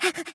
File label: imp Idle